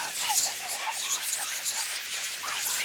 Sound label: Magic Projectile Move Loop